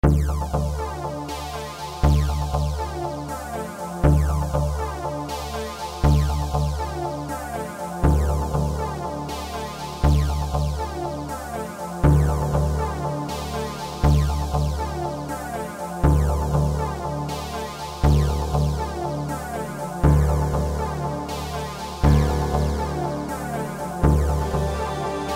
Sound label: SYNTHY SEQUENCE